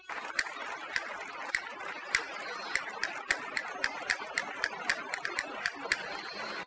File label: Castanets Violin Applause